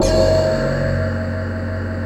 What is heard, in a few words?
F#1, bamboo